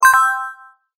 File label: collect